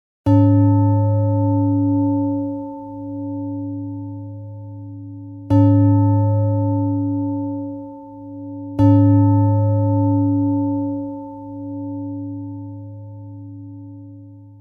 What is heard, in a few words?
gong small